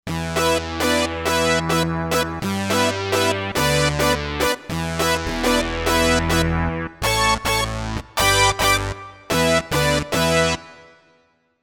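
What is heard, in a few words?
House, synth brass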